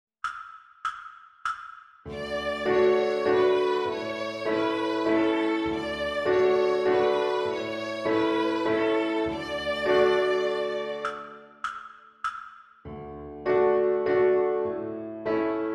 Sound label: Waltz